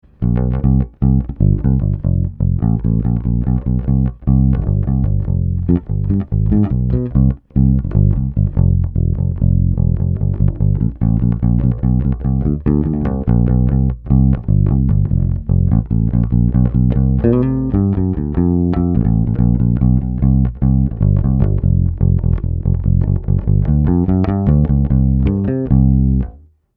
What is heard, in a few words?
HB Block 800b Pbass eq